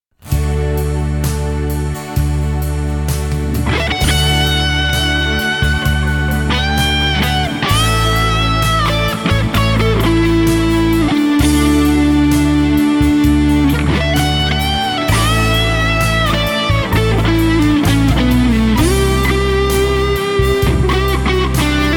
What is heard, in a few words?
1st SOLO; KPA